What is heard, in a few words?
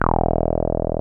303 OW E2F, 303 OW BS; Drums In Your FACE!